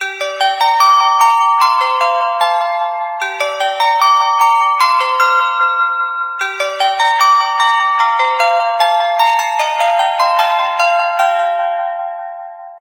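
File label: music default bell; Samsung GT-i7680; cmcc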